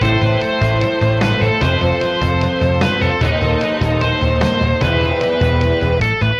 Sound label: keys, phonky loops